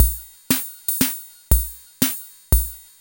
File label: 5-4 BEAT, KR55; INIS - Funky Xtreams; KR55 LP2